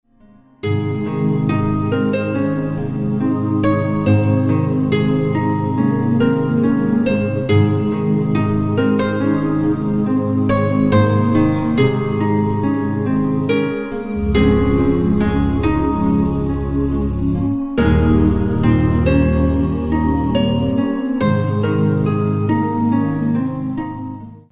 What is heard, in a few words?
hawaiian guitar